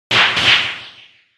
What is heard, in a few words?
punch; 攻撃